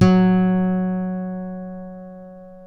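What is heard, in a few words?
F#2 FLAMENCO, FLAMENCO guitar; WorldSounds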